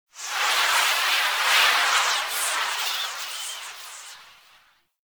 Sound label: Void Storm Wind Gust; Warframe